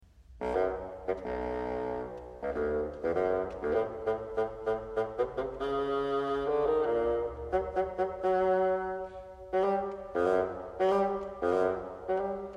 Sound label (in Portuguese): fagote